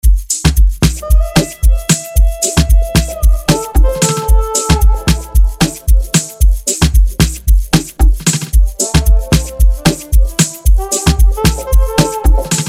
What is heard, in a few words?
Instrumental